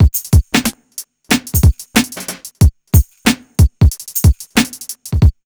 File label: BEAT, Hip Hop; USB Soundscan vol.34; AKAI; TIMBA